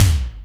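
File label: Wet Hex, Alesis D4 drum machine tom; 300 Drum Machines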